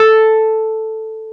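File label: piano, Wurly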